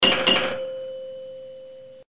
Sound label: Bell, ringtones; pinball ringtones